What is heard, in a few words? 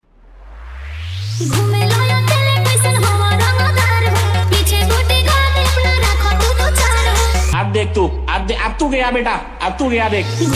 Bhojpuri Dj Mix Song